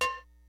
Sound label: LIVE AC KIT, DRUMS; Kid Nepro; Elite